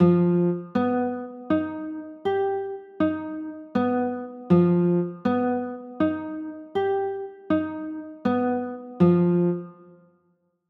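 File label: G sharp 7 chord, guitar; g 7 sharp 5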